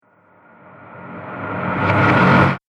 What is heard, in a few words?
Trailer Accent; Trailer Sound Effects; sound design